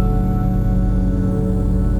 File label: Wandering Ambience; Inspired by Nature by Dillon Bastan; Vector Grain Presets